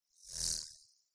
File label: fantasy fairy fly past wings flap fast